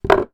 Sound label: card box empty small down on table; zapsplat